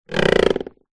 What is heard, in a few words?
household sofa arm creak